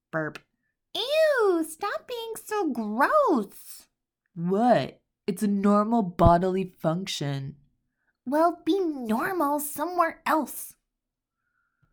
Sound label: gross burp bodily function